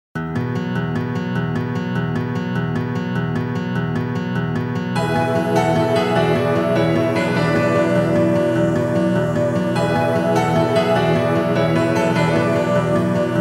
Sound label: Chiptune